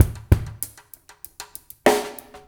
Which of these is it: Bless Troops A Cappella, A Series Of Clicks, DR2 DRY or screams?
DR2 DRY